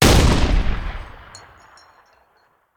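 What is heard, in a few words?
Artillery Cannon Anti Aircraft; Sound Ideas; Guns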